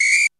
REV WHISTL; Back In Time Records; Big Bang

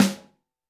Snare, Roland TD-20

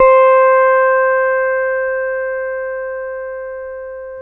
C4, LAP STEEL

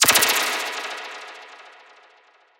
Synth FX

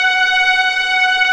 STR, Orchestral Strings

